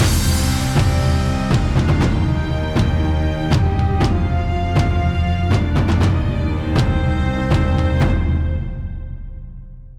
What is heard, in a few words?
mood Bosque